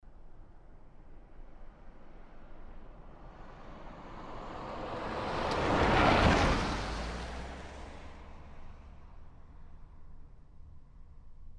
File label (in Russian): mjagkij